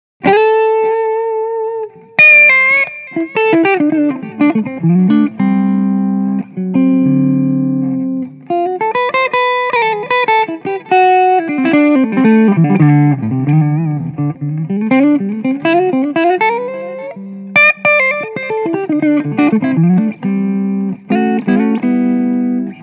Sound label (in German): hagstrom fantomen clean hals pickup; hagstrom fantomen test